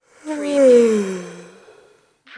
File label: Yawning, Female Yawn